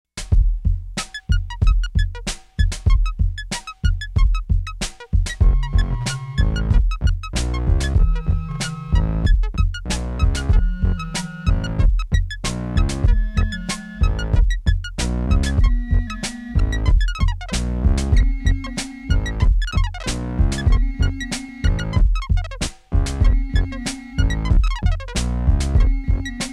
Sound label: Sixth Synth; Mark's Keyboard Repair